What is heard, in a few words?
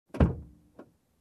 Unlocking engine hood